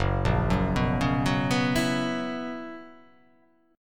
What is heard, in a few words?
Gb 11th; Gb11 arpeggiated; JGuitar